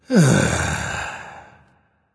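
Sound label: nonverbal content; smite; voicepack; ullr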